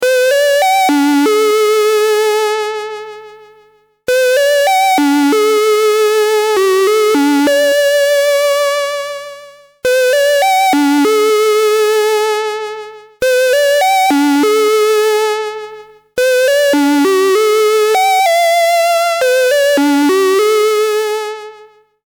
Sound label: Solus VRS 23 Softie